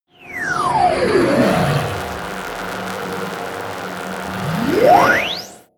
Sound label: promo pad